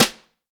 Snare Drum; Q Up Arts; Johnny.C's Vintage Snare Drum

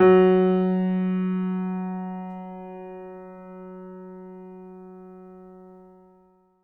MIX R, Acoustic Piano; InVision Interactive; Miroslav Vitous

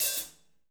snap; ID KITS; Sonic Reality; Interactive Drum Kits; 90s sample cds

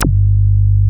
MOOG HI G1, ANALOG BASS; Music Store - Claudius Diemeter Schwerlos

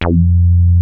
WEST SOFT F2, ANALOG BASS; Music Store - Claudius Diemeter Schwerlos

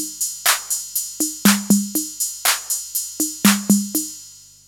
CR-8000 Loop, Techno, Drum Loops; Syntec; Wall of Sounds VOL-2; TECHNO-BOX; 90s sample CD

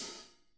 hats, Drum Kits; Sonic Reality

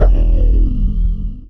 A-Syn, Fx Vox; Equipped Music - Loungin House

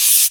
Cabasa Open; Multisamples; Digital Sound Factory Planet Earth

